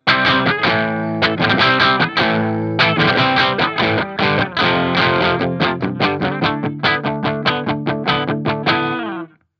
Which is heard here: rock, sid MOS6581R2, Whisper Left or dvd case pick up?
rock